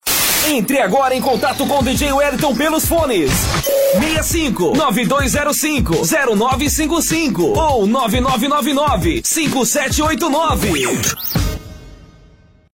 Top Dance

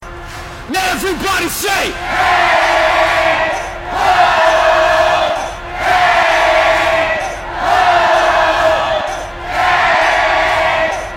Electro

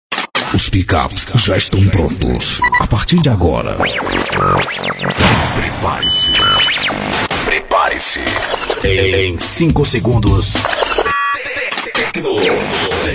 Techno Dance; Natal

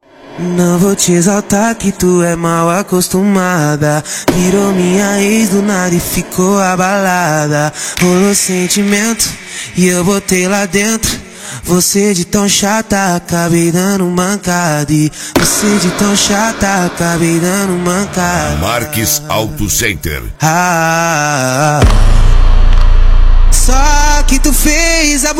Funk; Marques Auto Center

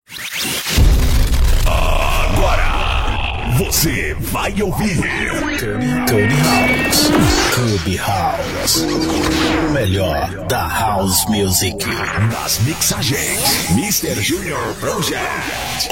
CUBE HOUSE

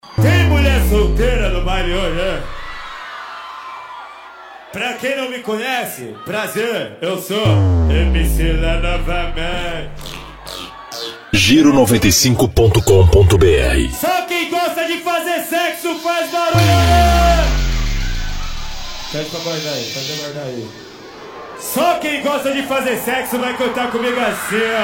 Bass

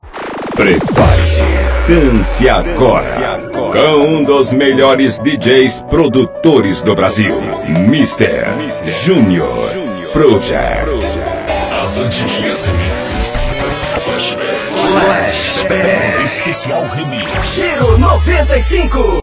Remixes; Flashback

